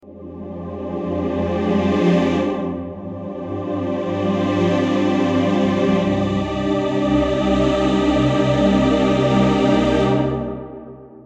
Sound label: Organ - Choirpad